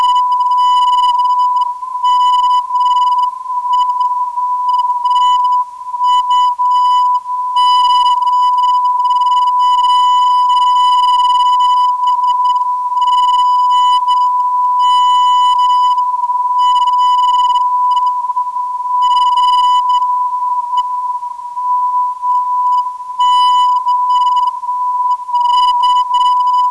MOU 324kHz

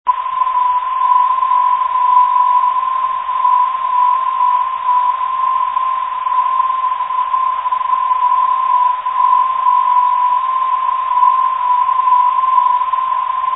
vh 322khz, NDB

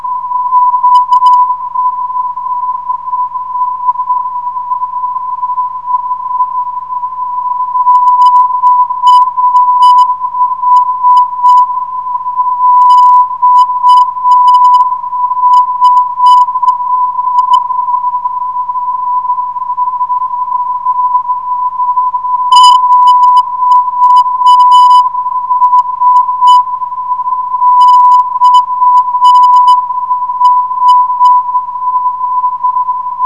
xs 515.4khz, ndb

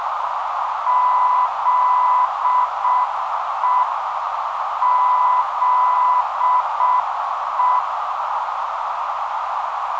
509.02kHz, ndb

